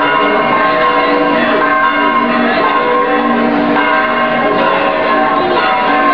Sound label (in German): glocken